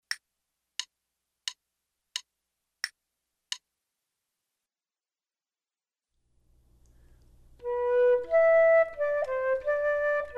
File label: Duets flute; Selected Duets for Flute Podcast